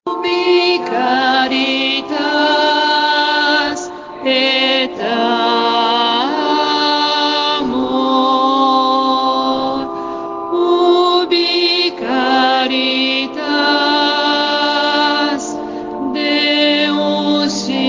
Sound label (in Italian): contralti; Ubi caritas